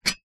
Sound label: metal fixing bracket lose slide movement; zapsplat; foley